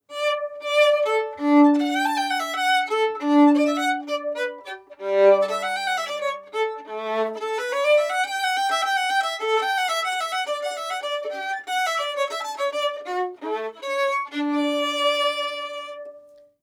Modern Bow Gut

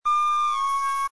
C sharp 6 w D6, flute multiphonics; modern

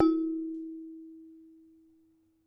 bellplate 2 modes; bellplates

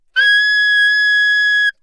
A sharp 6, soprano saxophone

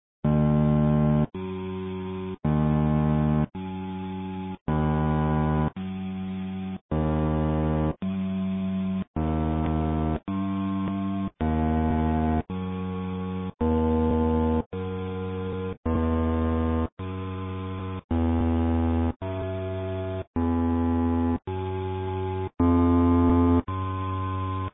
didjeridu

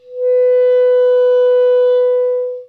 C sharp 5, clarinet

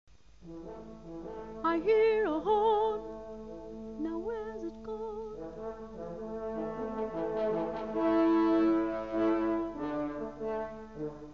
C brass

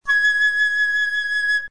G sharp 6, flute; modern

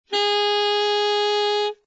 A sharp 5, saxophone, tenor

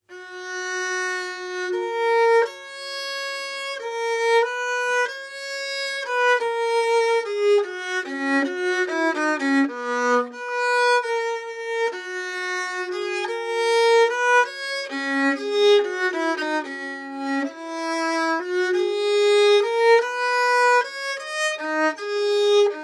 Baroque Bow Bridge Below f holes; Bassano

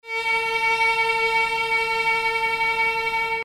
A sharp 4 reverb vib

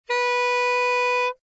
C sharp 5, soprano saxophone

